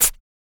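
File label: PERC SHAKER, MALE PERC; Spectrasonics; Vocal Planet; Jazz FX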